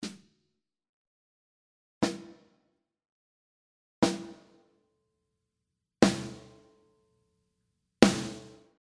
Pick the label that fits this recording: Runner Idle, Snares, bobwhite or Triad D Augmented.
Snares